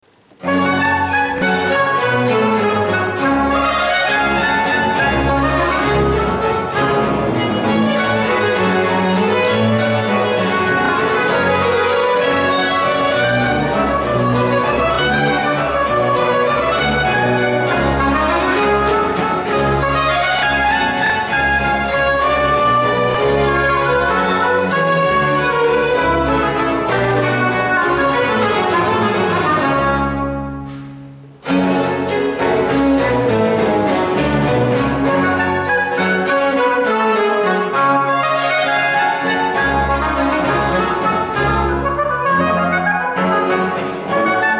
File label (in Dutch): concert voor hobo strijkers en continuo, Presto; G.B. Platti